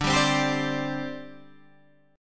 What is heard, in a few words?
D 7th Suspended 4th, down, Guitar, Half Step Down